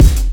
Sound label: smooth kick G key, Loudest frequency: 654Hz; single-hits-one-shots